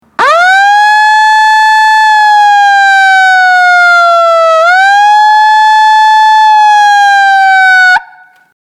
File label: siren, megaphones; thunderpower